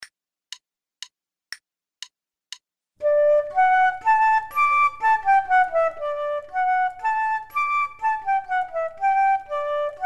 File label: Duets flute